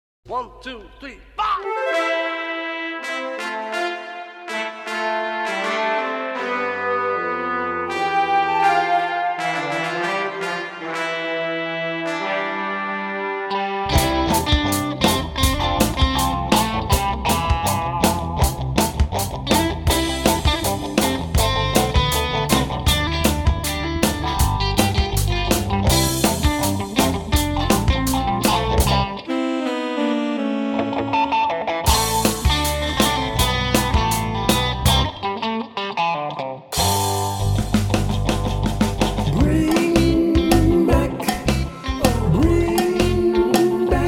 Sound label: JINGLE